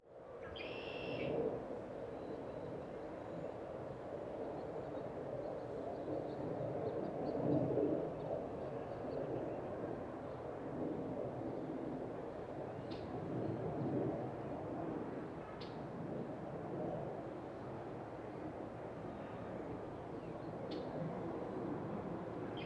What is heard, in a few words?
Outdoors Jet High in Sky Outdoor Ambience